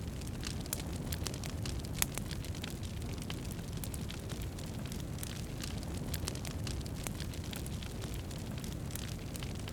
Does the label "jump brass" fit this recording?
No